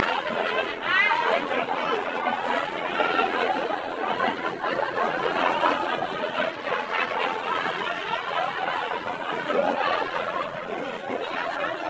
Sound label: laughter